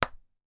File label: sport table tennis ping pong bat hit ball